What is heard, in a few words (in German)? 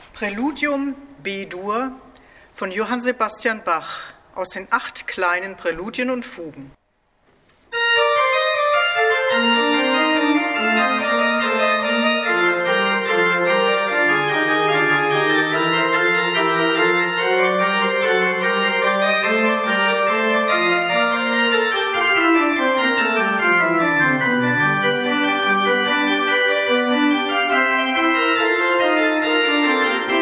praeludium b dur; rogate